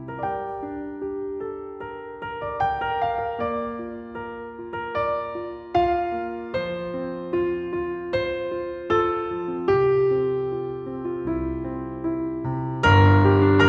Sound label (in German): piano